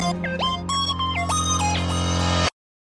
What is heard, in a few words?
Mobile Song